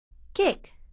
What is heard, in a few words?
kick